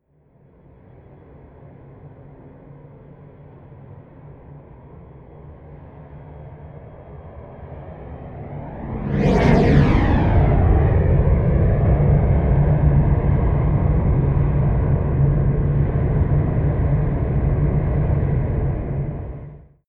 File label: plane cessna private flyby left to right close; flugzeuge verkehrsflugzeuge; verkehr transport